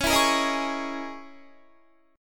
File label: C sharp Minor 6th Add 9th C sharp, Guitar; C#m6add9 strummed